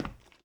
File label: ceramic step; Golden Crayon Sounds